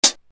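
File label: RX15 closed hi-hat, Yamaha RX-15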